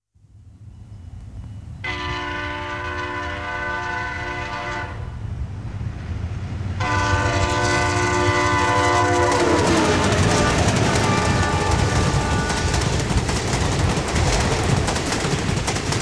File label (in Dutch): dieseltrein Amtrak claxons